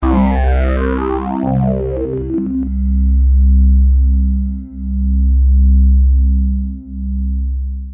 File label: drone